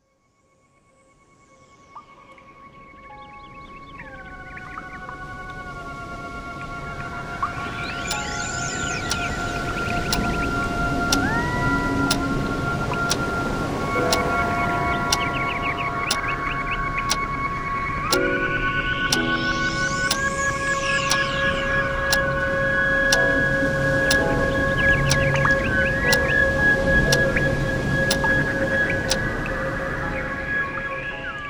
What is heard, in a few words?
Radio Mix